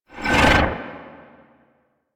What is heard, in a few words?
Harm End Cast; Warframe; Animus; Anima Animus